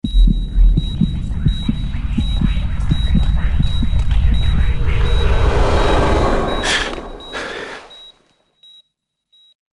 dream end; Страшные звуки для монтажа

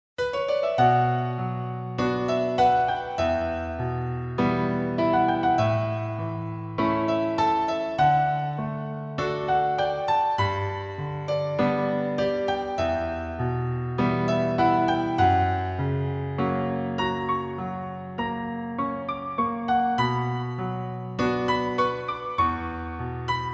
fortepiano

